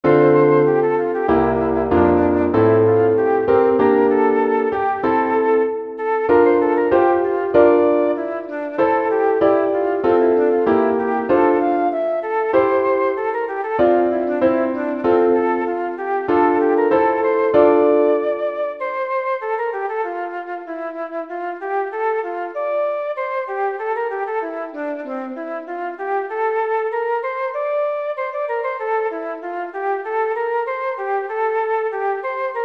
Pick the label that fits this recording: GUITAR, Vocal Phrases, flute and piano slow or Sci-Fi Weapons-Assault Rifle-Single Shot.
flute and piano slow